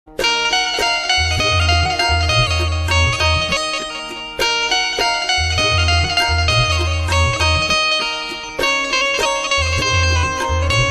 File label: Song Instrumental Ringtone